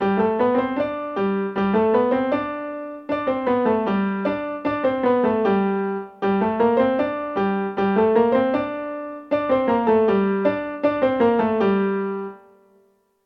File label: 16th note in G and Gm